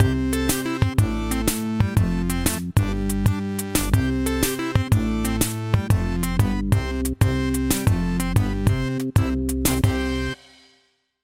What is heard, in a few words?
Logo Ident Tech Fun; SoundsCrate